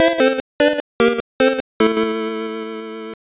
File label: Lose; kikansha thomas ni notte pico gamerip 1995